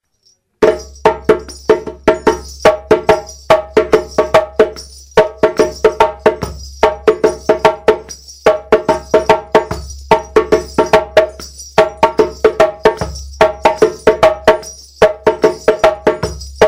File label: Kassa, djembe